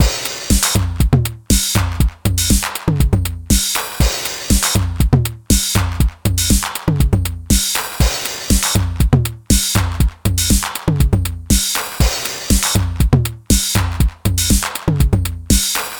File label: TR 909 FX; WaveAlchemy Revolution